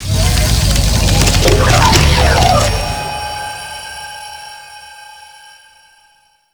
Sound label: Sentient Condrix Open; Squad Link